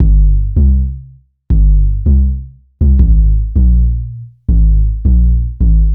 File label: Bass, Futurist Drum & Bass